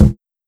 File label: Bongo